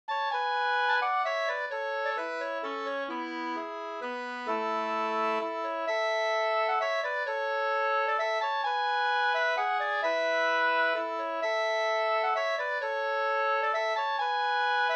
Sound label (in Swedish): langdans; fr Orsa SvL Da 2st